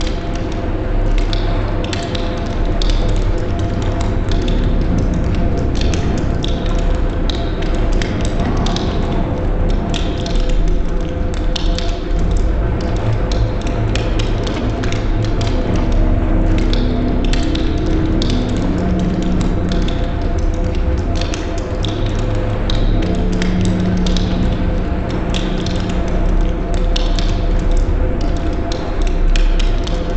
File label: piperoom loop; polarcomplex